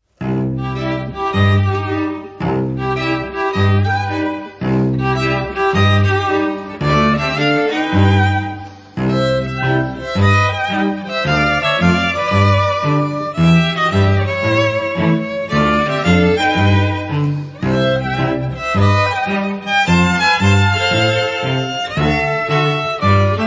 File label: ragtime